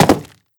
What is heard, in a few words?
Stone Jump